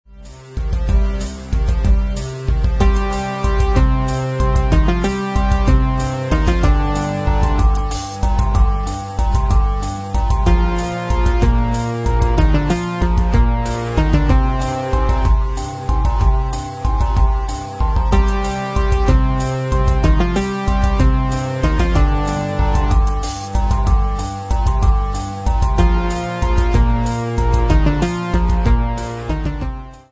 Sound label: 8bit; game of thrones